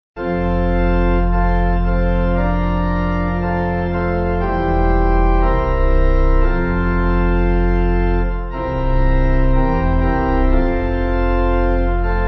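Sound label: Pipe Organ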